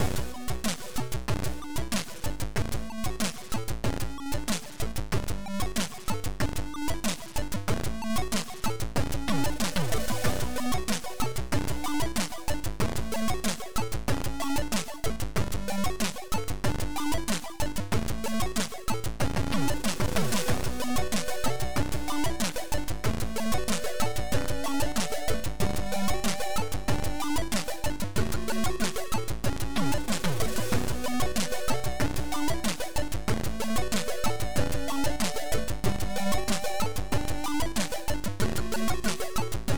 Intro Lounge; Delta s Shadow

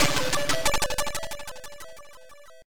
Level Completed

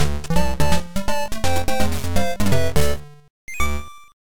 game music; Sophistry

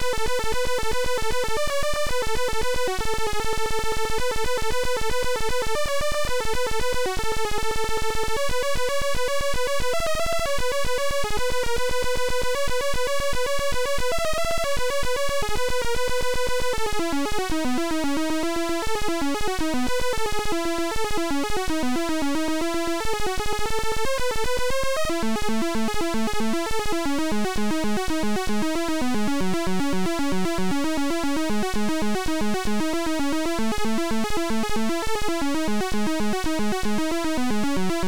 Title Beeper; Nebulus

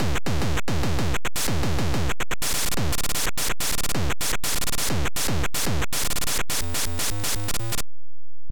Title Beeper; Alien Evolution

